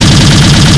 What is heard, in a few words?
Mach Gun Loop